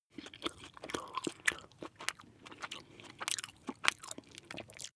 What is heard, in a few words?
chew jellybaby smack male